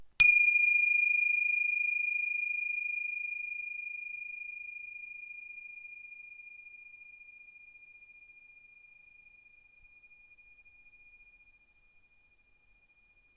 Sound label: grande tingsha